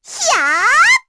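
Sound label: Vox Attack, Korean; Lilia; kingsraid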